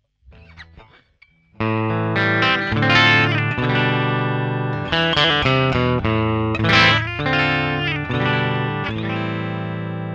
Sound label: bridge clean; axetec